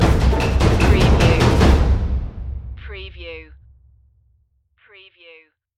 taikos stinger

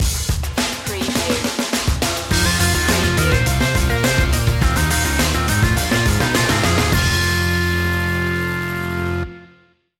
rock slam

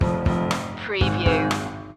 reykjavik stinger

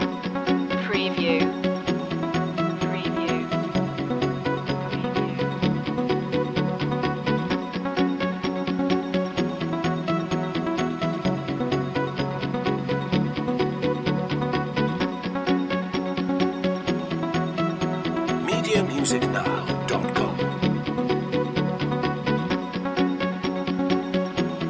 time piece loop

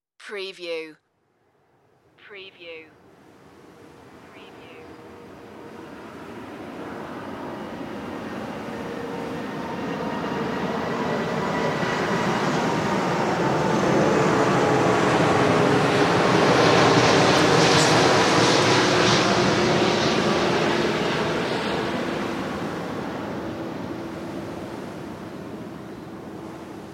airplane flyover

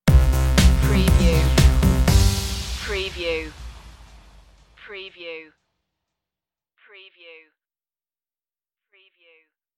hokkaido stinger

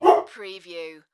Dog Bark; sound-effects-bible

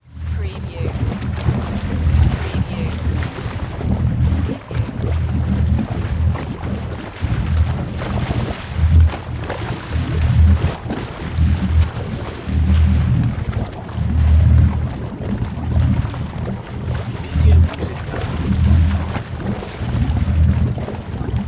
Boat Drive Reverse; sound-effects-bible

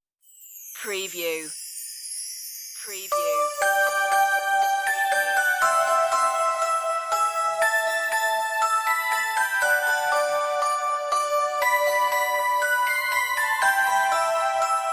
merry christmas bells no sleigh bells

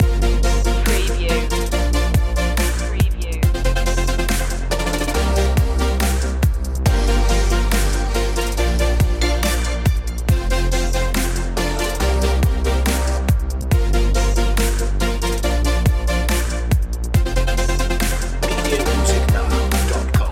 oriental loop

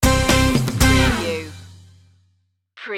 caribbean night sting

